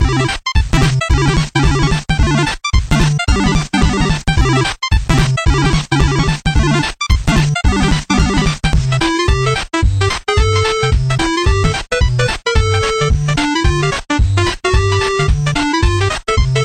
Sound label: NES Tri NES Pulse NES Noise